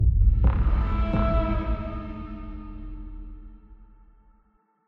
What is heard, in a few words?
UI Location Opened